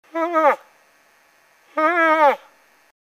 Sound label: Young Cow Come Get Me Big Boy, Moose